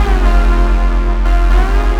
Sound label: Synth Chords; Eclectic Electronica